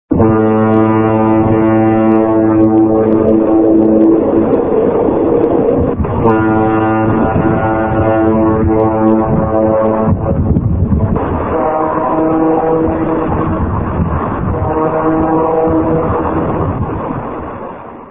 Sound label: fog